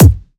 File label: original kick drum sound g sharp key